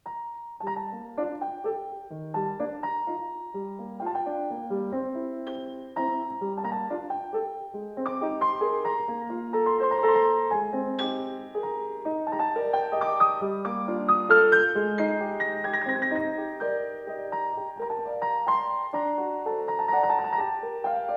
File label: waltz